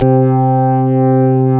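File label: New Saw Organ C5; Best Service Gigapack 2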